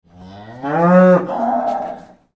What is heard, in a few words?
Cow Moo; Animal; Cows; Blastwave